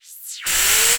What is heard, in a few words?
Spinner